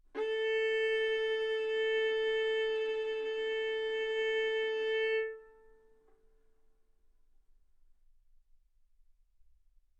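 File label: Violin arco mf A string A4 B4 stereo; sul A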